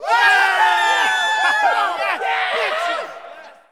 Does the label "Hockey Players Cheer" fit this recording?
Yes